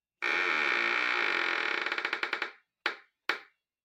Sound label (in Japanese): ドアがきしむ音; door